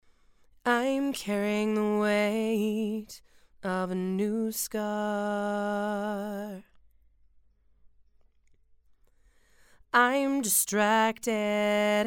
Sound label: Female Vocals; SCX25